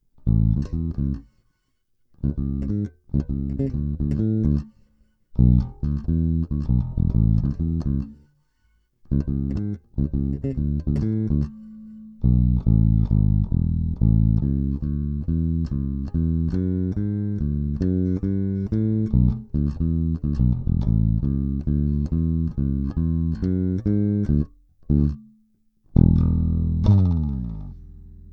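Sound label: 750C- L2000, bass; La bella; Rotosound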